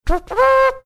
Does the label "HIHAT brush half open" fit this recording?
No